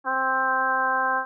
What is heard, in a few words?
trumpet